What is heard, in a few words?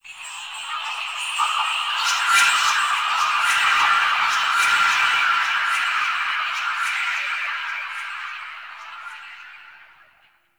Possessed Texture, Ambience; Warframe; Shadowgrapher